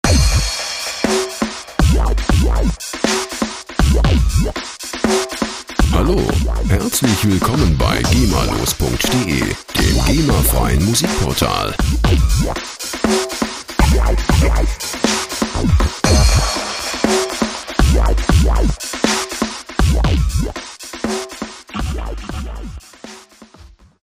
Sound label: drumloop